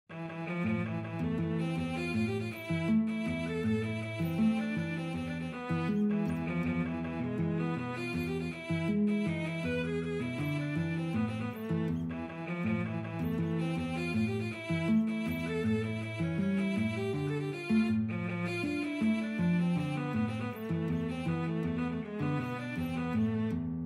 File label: guitar cello, guitar-cello duet; El Choclo